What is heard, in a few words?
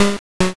cough